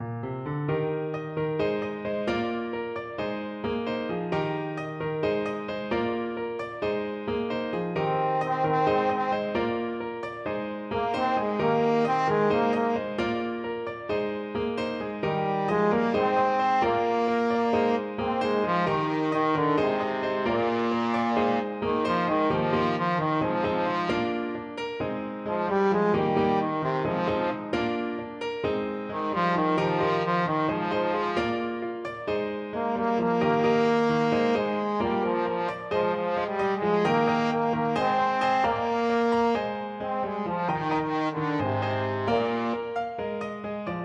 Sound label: trombone